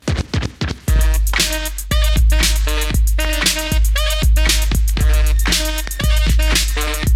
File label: brass money